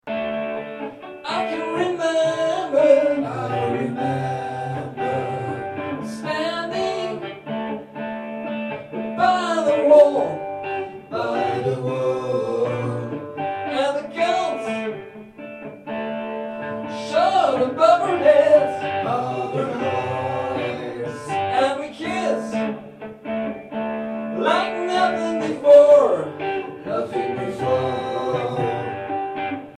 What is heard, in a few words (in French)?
choeurs